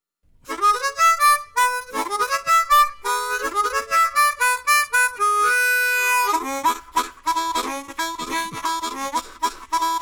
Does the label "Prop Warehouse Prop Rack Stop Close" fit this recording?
No